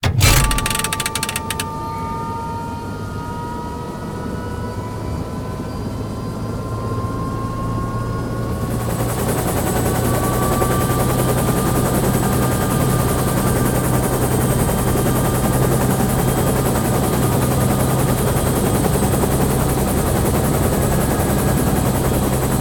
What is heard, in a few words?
takeoff